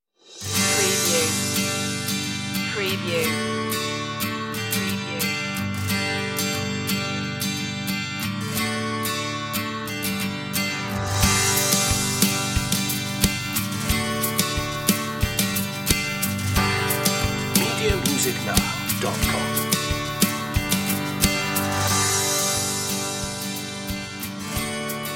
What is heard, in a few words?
underscore